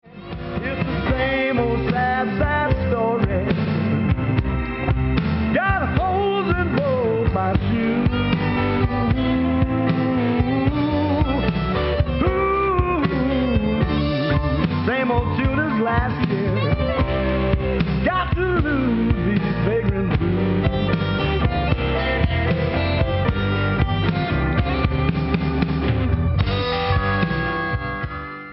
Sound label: blues